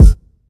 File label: steel kick drum G key